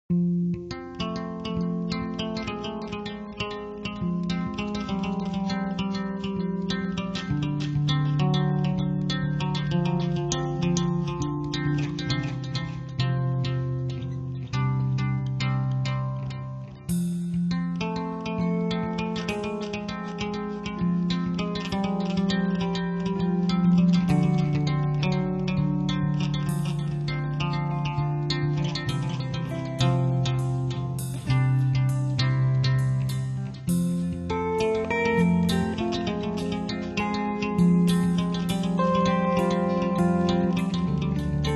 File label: Bass